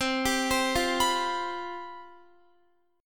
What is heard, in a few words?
C Major 7th Suspended 4th, down, Guitar; CM7sus4 arpeggiated